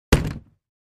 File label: Foot Locker Dropped, boxes crates